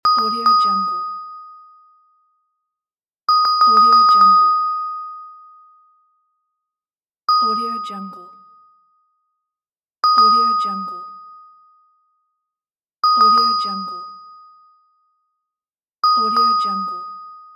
desk bell

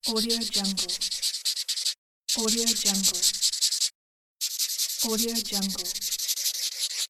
marker

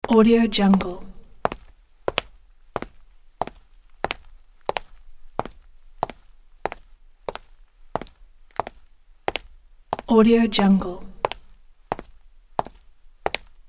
male in leather shoes on concrete floor; sound ideas

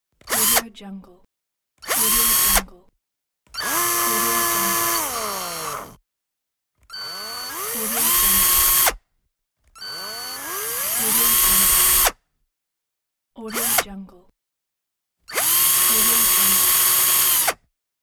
screwdriver sounds; jet-fx

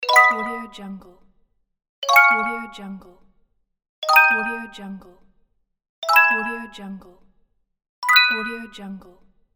positive bells level up

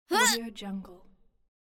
girl warrior hit scream

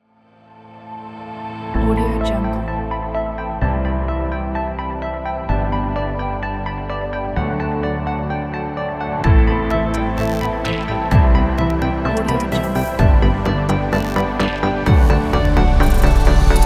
inspiring technology background corporate kit; goodideaproduction

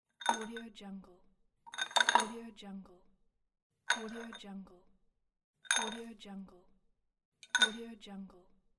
taking toothbrush

